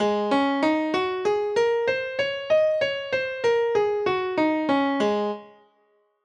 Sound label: hankdrum mixophonic tuning